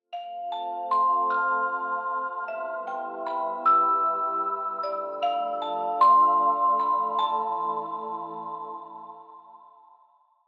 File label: Reminder Mix, ringtones; Walton NEXG-N70